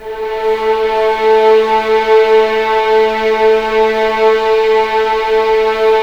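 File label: legato violin, The Ultimate String Collection; East West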